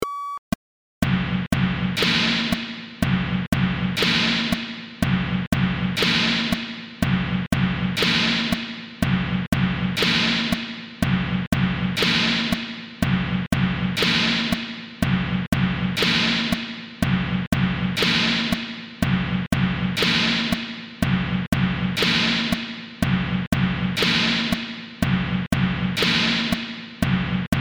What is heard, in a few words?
sine wave